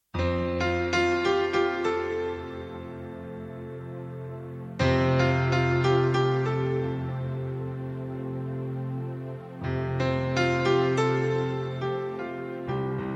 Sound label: Piano Piece Music